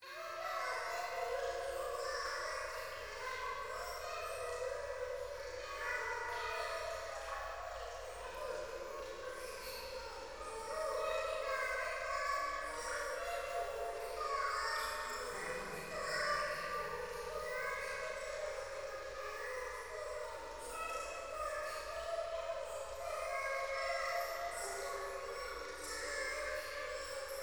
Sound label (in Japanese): 廃墟 可愛い喘ぎ 雨上がり